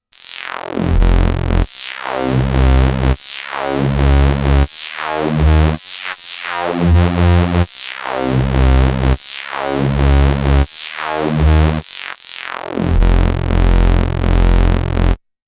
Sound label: KORG Odds Static Bit Reduction